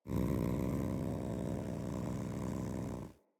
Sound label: Feral Vocal Idle; Catbrow